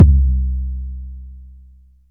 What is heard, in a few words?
warm sounding kickdrum sound c key